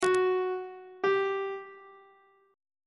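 f sharp perfect 1st augmented 1st intervals on treble clef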